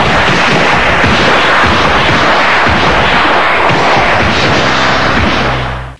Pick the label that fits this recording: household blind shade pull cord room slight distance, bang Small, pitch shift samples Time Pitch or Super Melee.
Super Melee